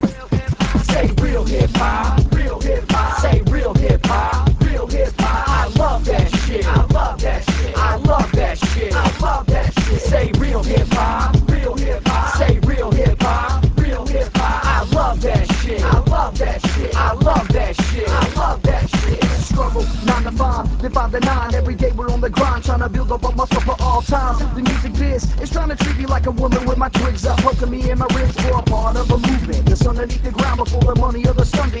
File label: real hip hop